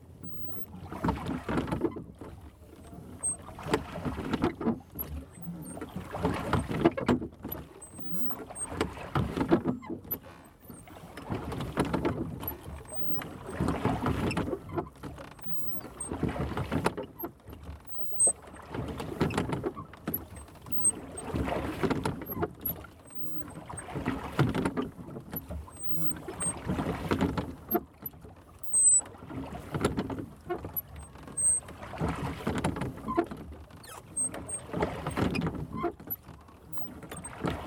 trakai barque son des rames

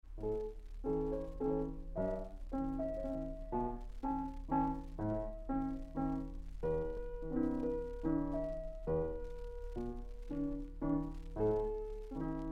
audition piano mal joue

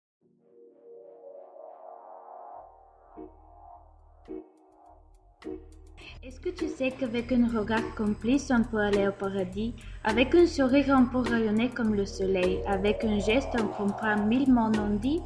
le choeur; petits riens

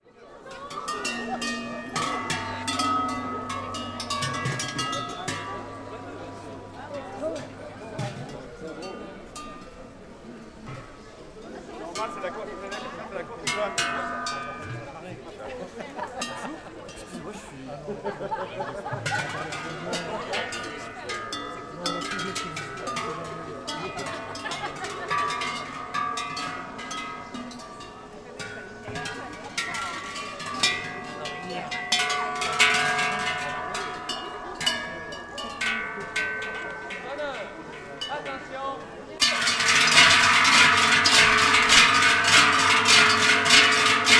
sonneurs de cloches

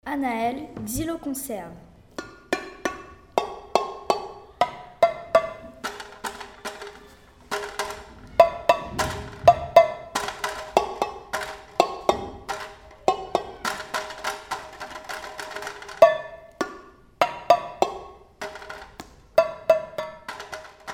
xylo conserve

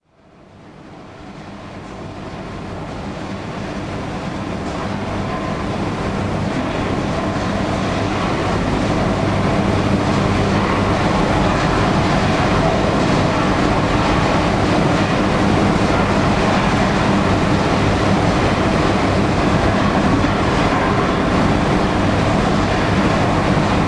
usine feurs

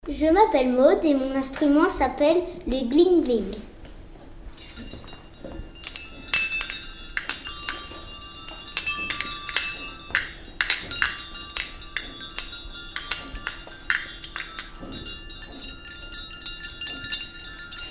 le gling gling; maud

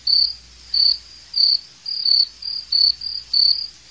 grillon

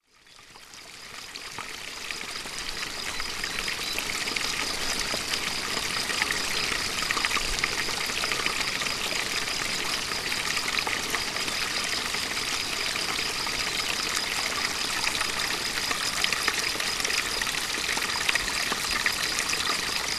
ruisseau et grillons

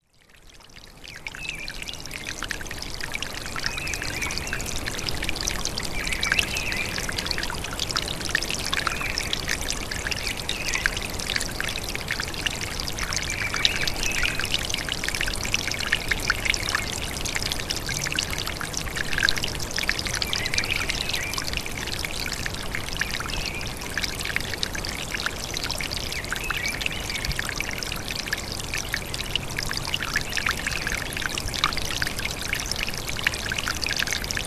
Ruisseau et oiseau

